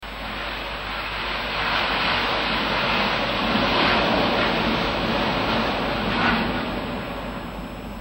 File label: air noise